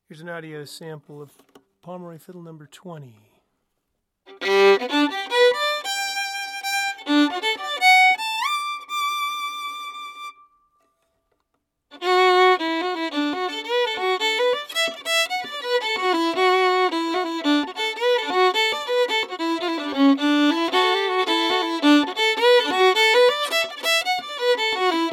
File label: fiddle